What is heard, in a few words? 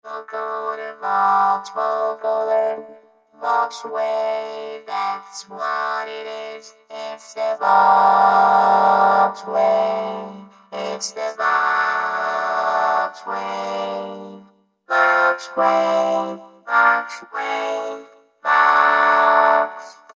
Korg microKORG Vocoder Vox Wave; Factory Voices